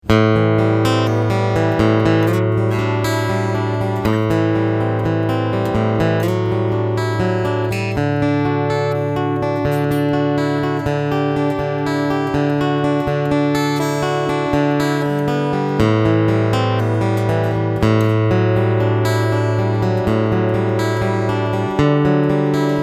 Epiphone J-45 November Burst Picking EQ Center Position; Epiphone Slash J-45